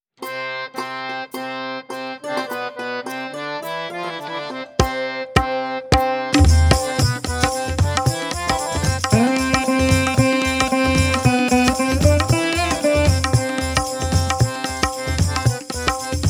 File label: Ladies Karaoke C Pancham